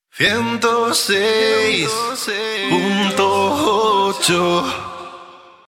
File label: cantante spanish singer, Diales de radio cantados